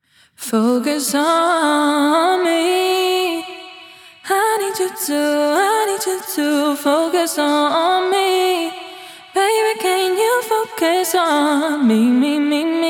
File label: Delay FX ON Female Vocal; DelaySon